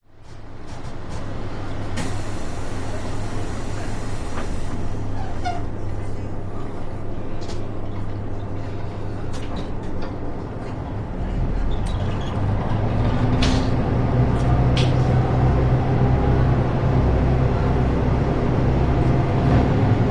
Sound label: kiha, rail